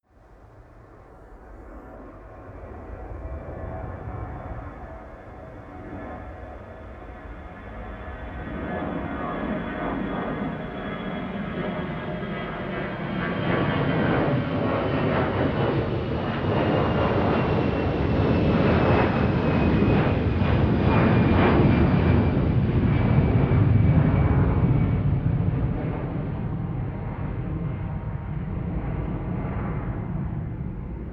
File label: plane flyby land tonal howl; flugzeuge verkehrsflugzeuge; verkehr transport